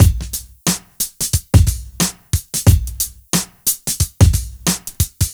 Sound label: Loop